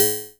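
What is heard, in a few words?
electronic metal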